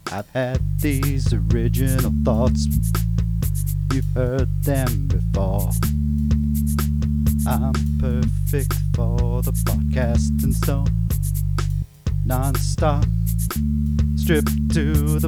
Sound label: bass idea